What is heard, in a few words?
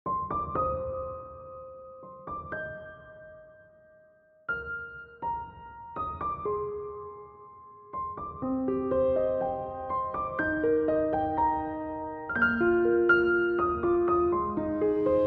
emotional piano